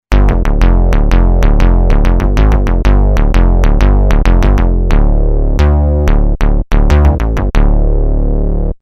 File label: megabass